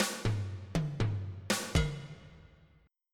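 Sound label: chacha, break; Sounds Terrific II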